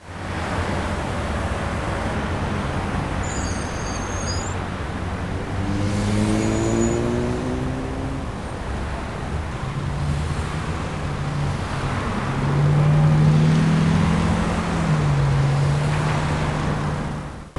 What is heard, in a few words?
auto